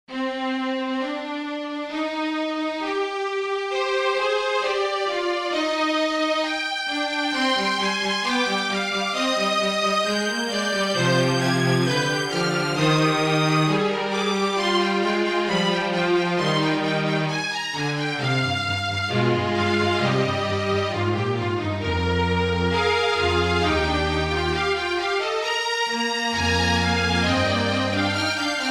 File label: Canon for Strings